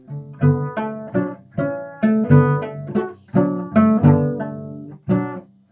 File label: pizzicato